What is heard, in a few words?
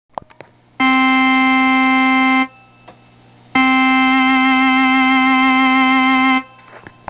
delay Vib, organ